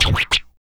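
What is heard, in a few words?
SHORT PERC, MALE PERC; Spectrasonics; Vocal Planet; Jazz FX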